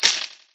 till

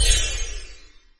spell Fail

